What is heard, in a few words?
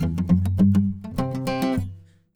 Guitar GC, Acoustic